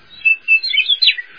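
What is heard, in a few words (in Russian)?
короткое насвистывание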